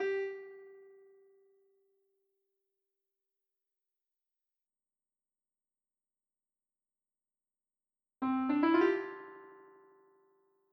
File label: piano